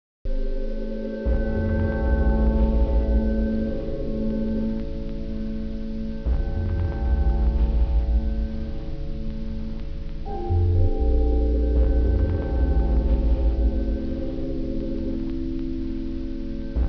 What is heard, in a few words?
supercollider synthdef